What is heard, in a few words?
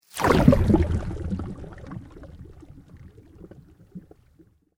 Sweep Organic Medium; Created sweeps, swooshes and other movement effects; Sweeper Sound Effects; Sweepers (Sound Effects:Sound Design)